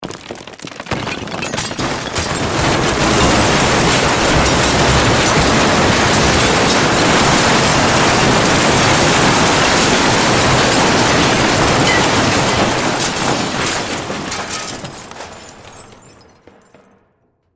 Destruction Metal Glass Rock Wood Debris Large; Crashes; Crash Roll Metal Glass Debris Large Object Long; Blastwave

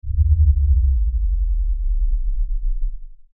Lfe Descend Low Bass; Descending; Blastwave

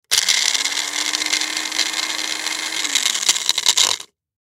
Blender, Blender Turn On Run Crush Ice Turn Off; Magic Bullet; Appliance; Household; Blastwave

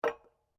SLEDGEHAMMER METAL IMPACT LIGHT

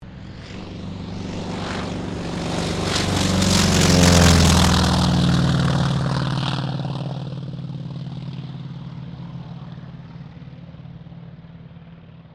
AIRCRAFT T-28D TROJAN TAKEOFF; Airplanes (Sound Effects:Vehicles)

